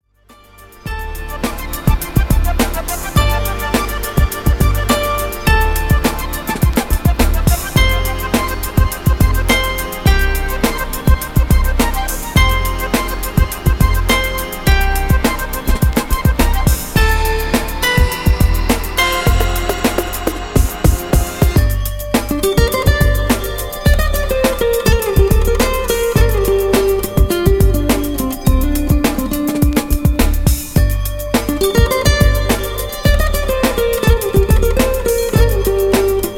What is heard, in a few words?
Lounge